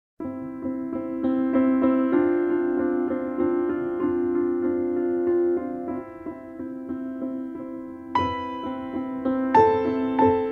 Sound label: Grands Ronds De Jambe, ballet; adv 1 ballet